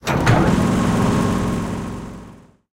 Removable Workshop Motor On

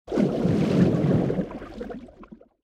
Sulfur Springs Fail

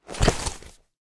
UI Christmas Collect Present

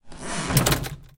UI Chest Close

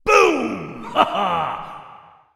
Activated Concussive Blast; BTD6

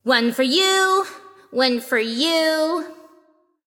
Activated Molotov; Harley Gwen

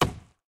UI Prop Axe

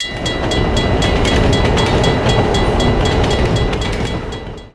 train metro warning

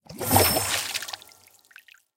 Sulfur Springs Sequence

